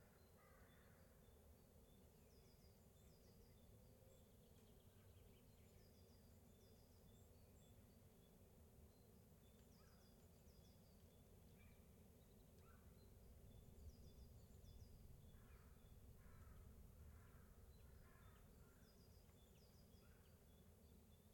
Suburb Outskirts - Forest Edge - Outside Summerhouse - Many Birds - Wind; 7.1.4; Atmos; MKH; Pole Position; Ambiences for Atmos Vol 2